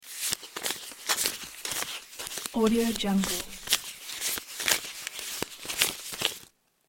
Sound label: counting money